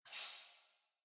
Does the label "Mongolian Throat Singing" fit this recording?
No